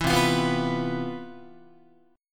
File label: C Major 11th C, Guitar 4A,4E,5A,5D,5G sharp,6C sharp; CM11 strummed; JGuitar